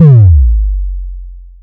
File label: combo break; osu!; cookiesi + EasternDreamHWF-EC